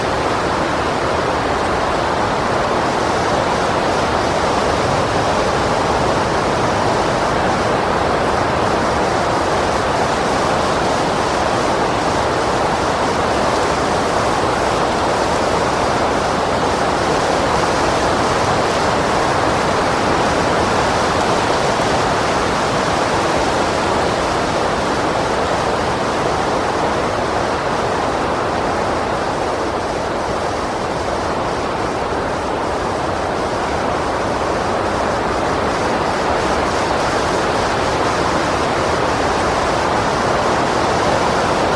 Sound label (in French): Foret avec fort vent dans les arbres